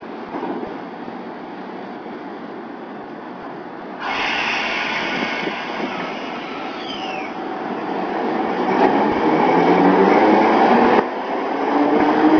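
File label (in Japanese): 京阪電鉄80形 蹴上駅出発時の走行音; 蹴上駅出発時の走行音（1997年9月11日収録）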